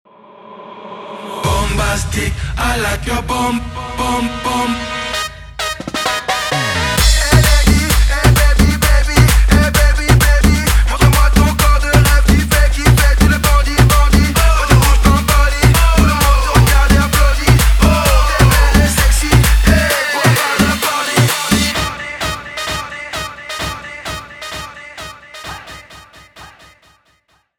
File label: intro outro 130 bpm